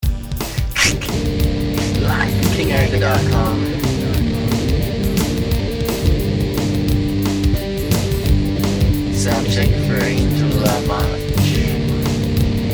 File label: Psychedelia Progressive Rock; Obverse Reverse Verses